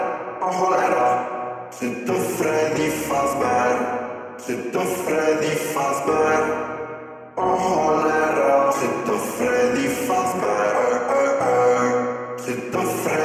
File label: reverb; pomni freddy fazbear; amazing digital circus x freddy fazbear